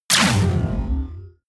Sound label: sci-fi shock explosion